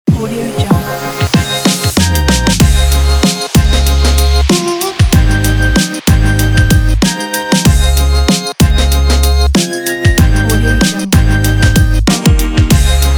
vlog hip hop

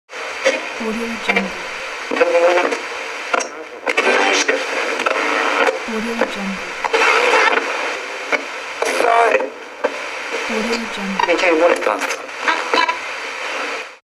radio tuning

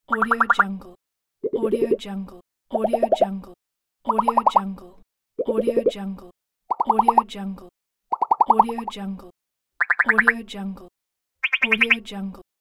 ringing

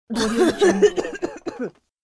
strangling cough female; fxprosound

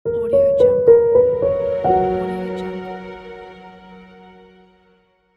inspiring piano logo; musicalsmile

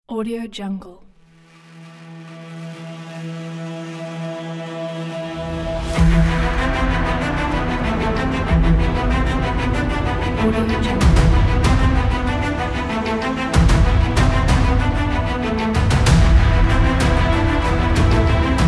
cinematic teaser trailer

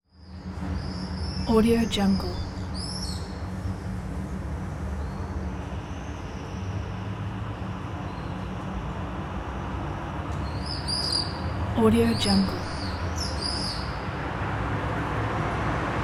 city morning ambience with traffic and birds

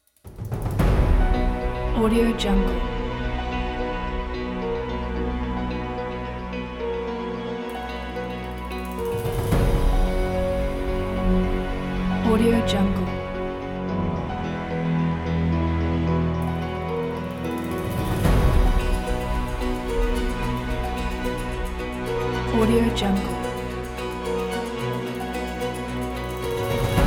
uplifting cinematic happy epic

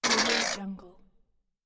alien insectoid monster voice

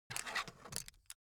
foley office pen pickup; smartsoundfx

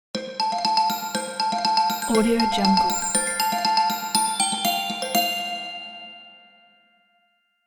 xylophone happy

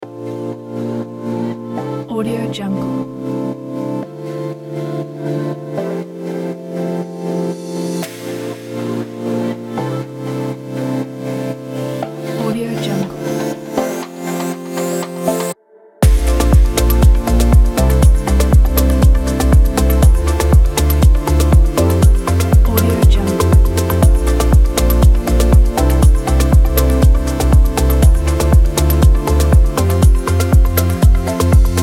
fashion house lounge

